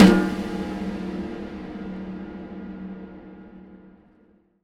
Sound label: Snare Hit, Impact Drum Hits; Sonic Foundry (Sony Creative Software); The Electronic Music Manuscript Collection